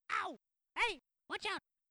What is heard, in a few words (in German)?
spieler wird getroffen; jazz jackrabbit 2